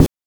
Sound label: GHOST BD; NES DPCM; MIDINES